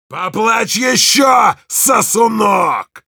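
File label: Heavy yell, Russian; Поплачь еще, сосунок!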